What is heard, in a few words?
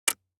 DICE on Hard Wood, Grab, Standard, 3 Three Dice; Samples; ArticulatedSounds; DICE Sfx Party (Extended)